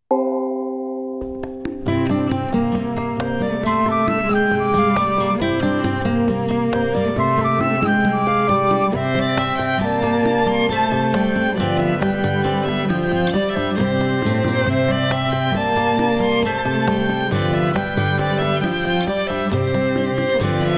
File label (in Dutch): instrumentaal; MuZiDo; Okido